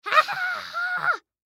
Human Scream; Scream Ahh Male Six Yrs Boy; Blastwave

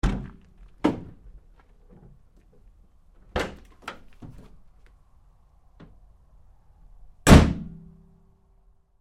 Truck GMC Savana Door 2nd Rear Open-Close Hard

